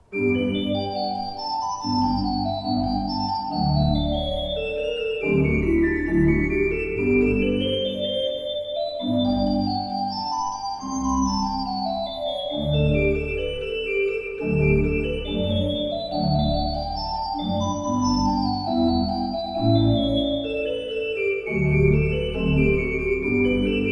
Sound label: pipe organ; Schuke Potsdam 25 II P